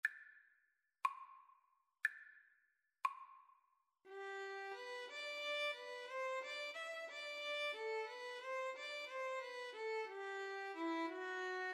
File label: String trio